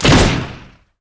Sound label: attack; jinx base